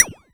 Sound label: percussion; FutureRetro FR777